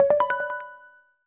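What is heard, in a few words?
Correct Song, gameplay sounds; Spirit Flute song correctly played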